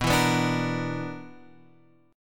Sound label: B Minor Major 7th Double Flat 5th, Guitar, Open F; BmM7bb5 strummed; JGuitar